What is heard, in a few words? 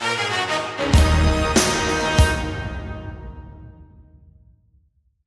music